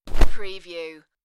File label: Cloth Character Movement Foley Whoosh